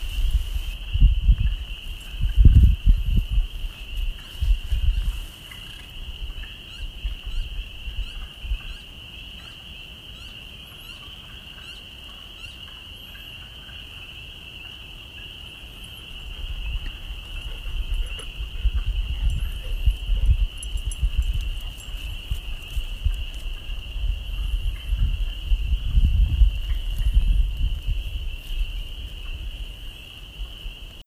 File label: Frogs